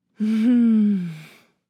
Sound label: Sexy moaning woman female